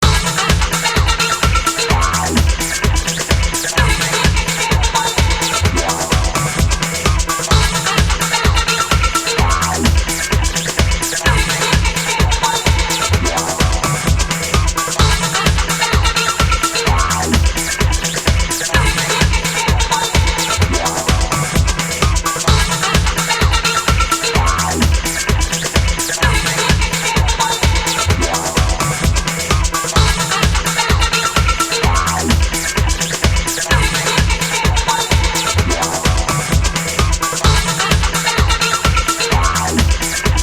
Victory Theme; Impact Asteroid Combat; Windows gamerip 2006; Expert Win

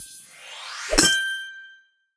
Piggybank Goldbar Fly Into Piggybank; candy crush saga gamerip

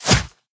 Backstab Stab; Dark Army Archer

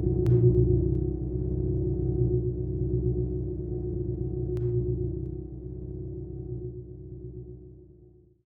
Particle Magic Sound Fade Out

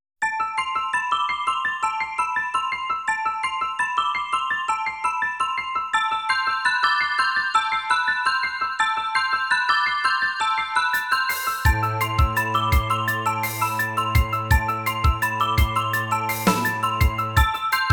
SYNTHE; kenshuui tend dokuta original soundtrack

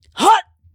Jump; God Tamer

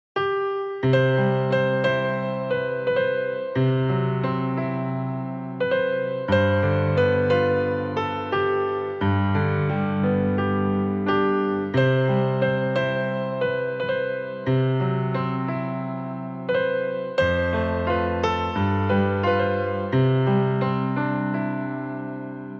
Piano version; quartett the stage of love ost

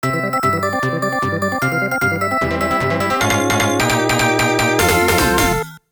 Challenging Stage Perfect Music; Galaga '88

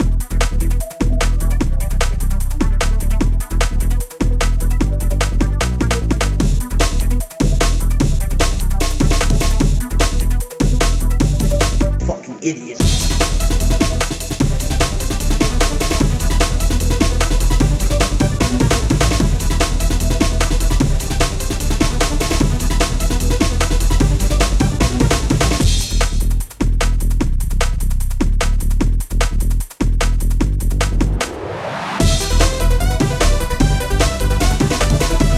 (Instrumental); Friday Night Funkin' Vs. Bambi Arable

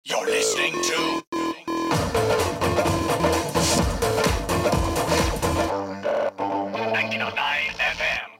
Radio Car Electro Jingle; gangstar rio city of saints

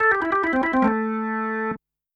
cheer keyboard; Animation Throwdown

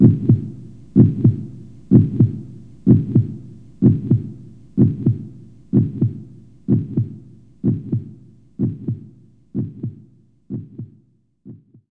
Heartbeat

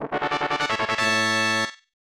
Treasure Box Acquisition; Fire Emblem 3 Mystery of the Emblem; 1993 snes

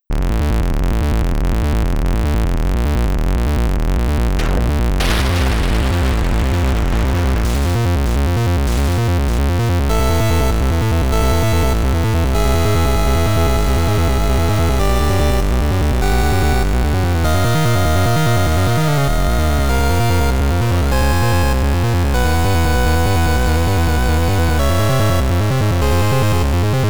Chiptune; Alien Volcano

